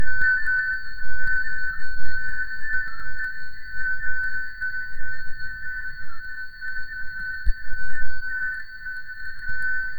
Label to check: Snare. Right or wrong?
Wrong